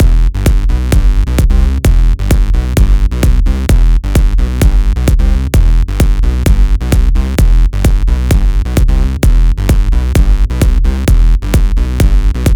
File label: Phat Bass Line Kick Em 130 Bpm; Axel Wayn